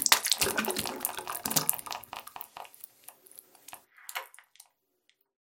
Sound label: xen dripping; ambient; Half Life Alyx